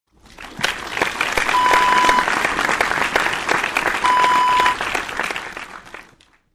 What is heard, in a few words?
applause